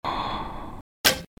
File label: dart; csgo; GFL; blowdart